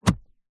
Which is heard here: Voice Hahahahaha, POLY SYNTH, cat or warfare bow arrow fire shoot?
warfare bow arrow fire shoot